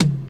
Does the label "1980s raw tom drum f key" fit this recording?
Yes